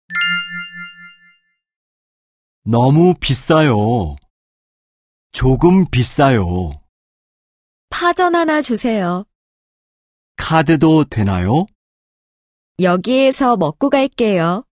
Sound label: K-POP